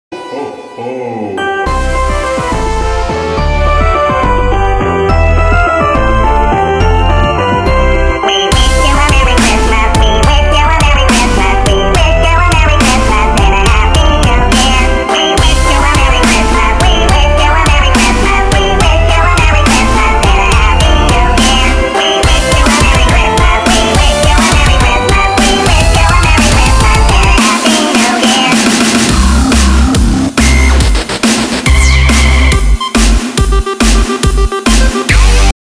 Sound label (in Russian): Новогодний дабстеп